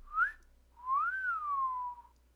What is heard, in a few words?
Wolf Whistle, rat